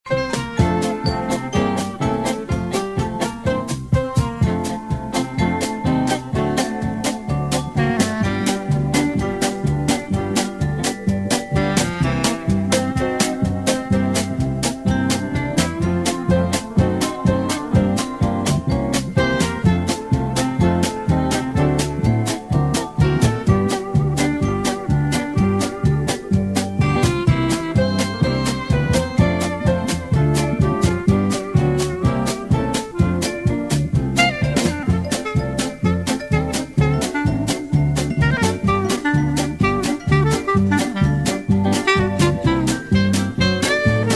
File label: music